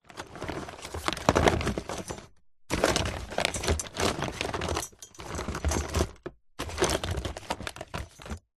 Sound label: container plastic rummage odds and ends